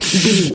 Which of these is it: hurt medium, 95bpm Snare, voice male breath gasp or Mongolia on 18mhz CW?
voice male breath gasp